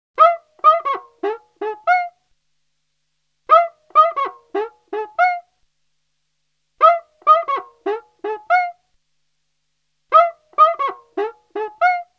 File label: cuica